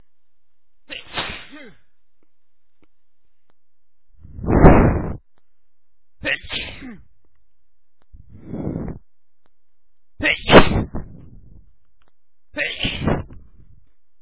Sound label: Sneezy